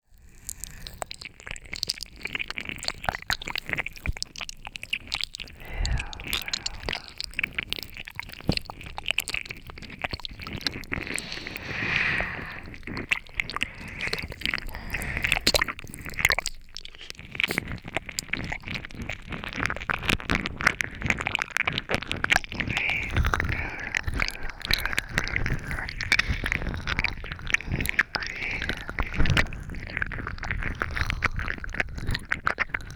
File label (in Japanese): W耳舐めブレンド